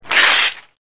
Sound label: paper tearing